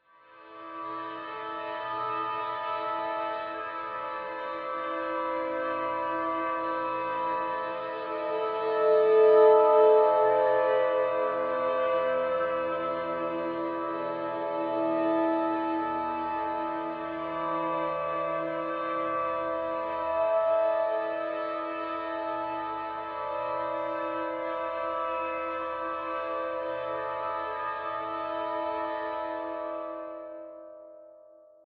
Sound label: spooky